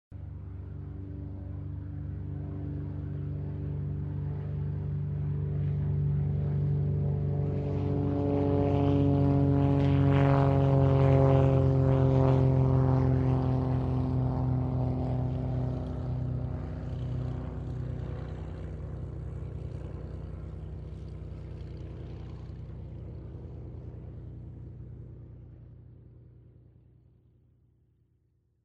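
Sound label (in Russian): самолет второй мировой пролетел над головой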